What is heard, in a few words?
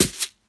Shaker 2 (Low)